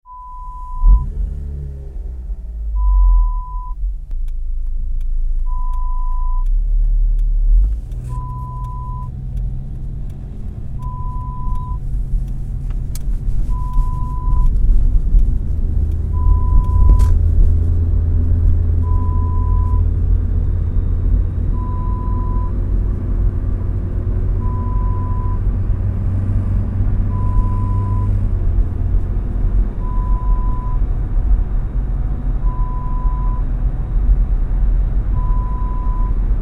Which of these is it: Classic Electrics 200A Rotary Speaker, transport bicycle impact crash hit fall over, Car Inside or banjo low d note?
Car Inside